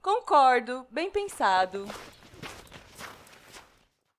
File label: Walk; Elane